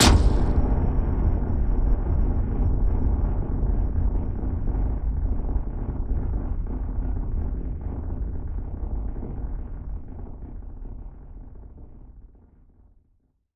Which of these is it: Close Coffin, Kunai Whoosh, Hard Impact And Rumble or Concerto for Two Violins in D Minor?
Hard Impact And Rumble